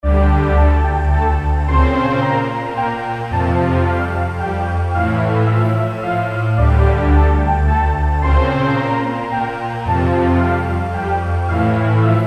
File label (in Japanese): ファンタジーで壮大なBGM